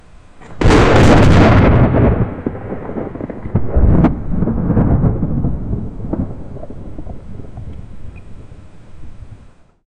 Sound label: bubbling magma volcanic r